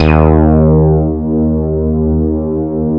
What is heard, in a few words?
SH 1000, BASSES